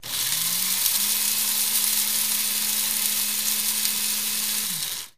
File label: Coffee Grinder: Electric Machine Spin.